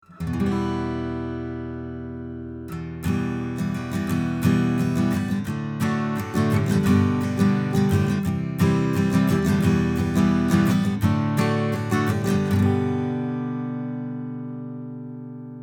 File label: Cardioid Strumming A